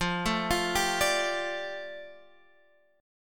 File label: F 6th Add 9th F Guitar Half Step Down